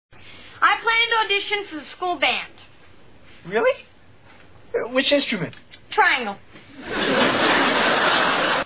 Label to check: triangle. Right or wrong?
Right